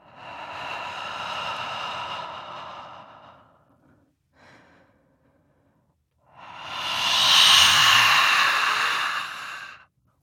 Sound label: Ghost Creature